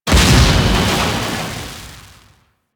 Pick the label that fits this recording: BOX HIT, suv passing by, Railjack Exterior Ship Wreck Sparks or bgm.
Railjack Exterior Ship Wreck Sparks